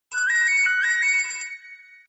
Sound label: nokia do mi so